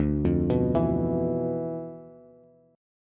D Minor 6th Add 9th D, Bass; Dm6add9 arpeggiated; JGuitar

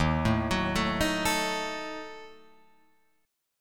Eb Diminished, Guitar, Open F; Ebdim arpeggiated; 3,4,6,4,6,4; JGuitar

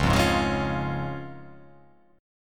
C sharp Major

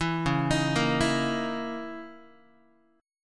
C sharp Minor 6th Add 9th C sharp, Guitar; C#m6add9; arpeggiated; JGuitar

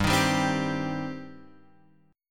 G Suspended 4th Sharp 5th, Guitar, Open F; Gsus4#5 strummed; JGuitar

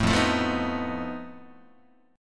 F 7th Flat 5th F down Guitar; F7b5 strummed; 0,1,0,3,3,2

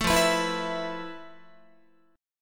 F Major 7th Flat 5th Guitar; strummed

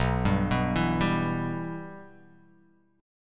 B Suspended 4th Sharp 5th B; Bsus4#5 arpeggiated; JGuitar

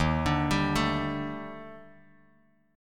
Eb Major 7th Flat 5th, Bass Standard; arpeggiated; chord

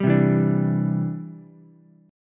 C Major Add 9th, Ukulele; strummed; JGuitar

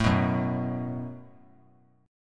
F sharp Minor Double Flat 5th; strummed

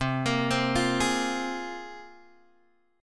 C 7th Suspended 4th Sharp 5th, Guitar, Open G

